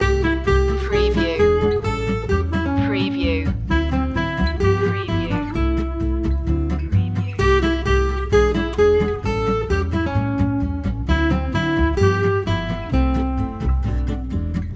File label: ragtime loop